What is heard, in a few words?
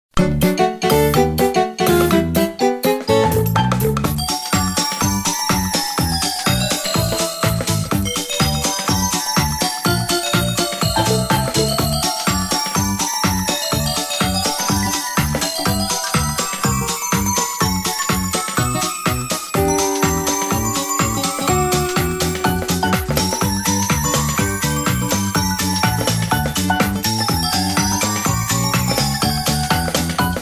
Athletic Theme Coin Rush